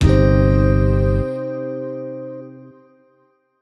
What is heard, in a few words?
Retry Jingle